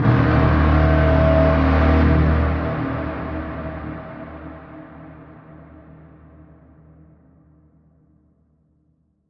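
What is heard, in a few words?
Alarm Colossal Warning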